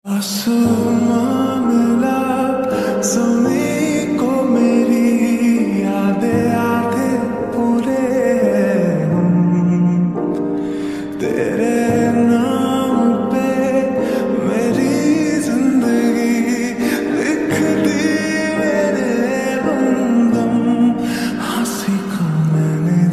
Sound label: Love Ringtone